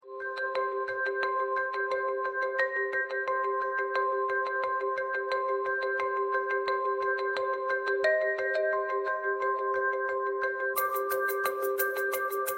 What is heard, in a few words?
emotional ringtone